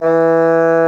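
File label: SOFT BASSOON E3; Northstar - New Gold VOL-2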